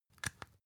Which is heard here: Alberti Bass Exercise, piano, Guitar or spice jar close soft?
spice jar close soft